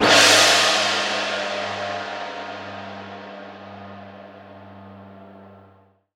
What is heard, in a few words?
Crash, impact samples